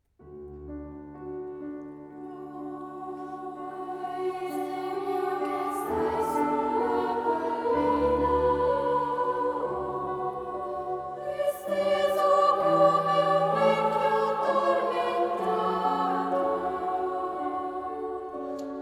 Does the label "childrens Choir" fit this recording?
Yes